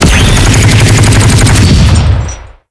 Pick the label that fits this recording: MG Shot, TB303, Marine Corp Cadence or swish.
MG Shot